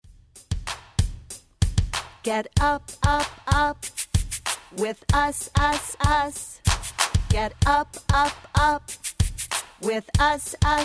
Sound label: Get Up with Us short u rap